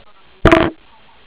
STEP; BANDOR1

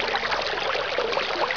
WATER

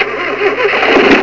start; skidmarks

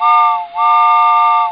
Train Whistle

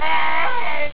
AARGH

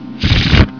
SHUFFLE

